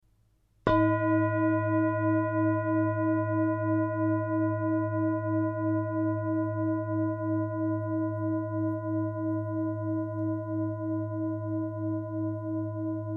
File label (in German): Klangschale; 2072g